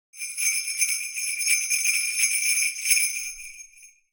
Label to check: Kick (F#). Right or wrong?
Wrong